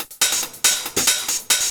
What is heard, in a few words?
DRUM, HOUS-TEC DRUMS; MAGIX